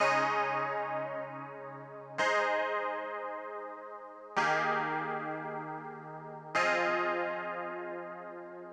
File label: Clavinet Fm 110; Sample Banks; Melody; Keyboards